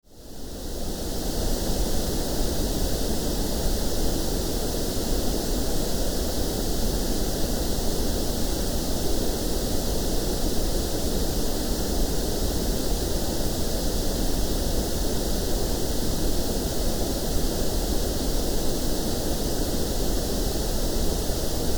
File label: Calm Pink Noise